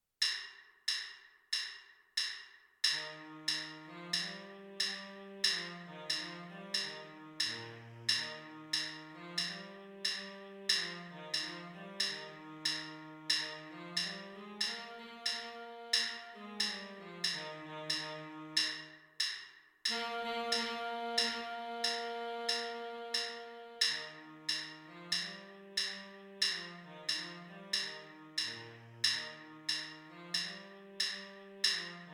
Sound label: alouette tenor sax